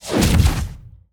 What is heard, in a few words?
Basic Woosh